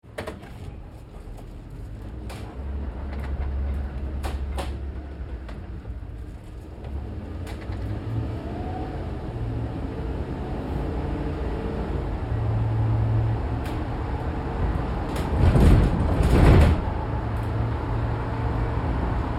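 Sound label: Truck GMC Savana Interior Drive Accel Decel Fast; 2010 GMC Savana; Trucks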